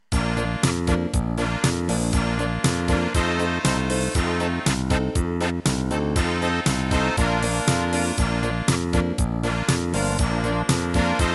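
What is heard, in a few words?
Pop Polka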